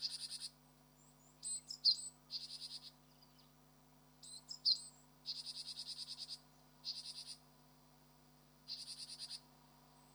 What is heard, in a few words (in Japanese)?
シジュウカラ, 【危険注意】; Parus minor